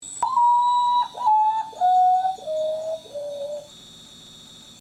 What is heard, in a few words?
Common Potoo